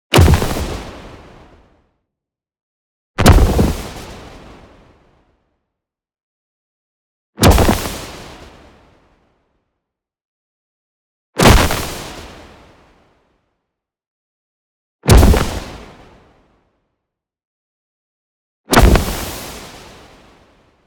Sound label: EXPLOSION SHORT Smoke Bomb; boom library; Anime Essentials